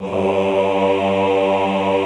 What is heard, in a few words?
AHH G1, Classical Choir; Best Service; Extended Classical Choir; AHH MIX